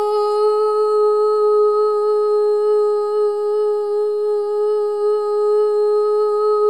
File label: solo boy; Spectrasonics; Symphony of Voices